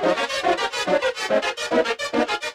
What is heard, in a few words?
NEW RYTHM3, Yamaha VP-1, NEW RHYTHM; Music Store